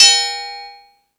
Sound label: Percussion